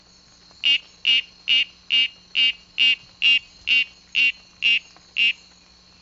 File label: Oreophryne loriae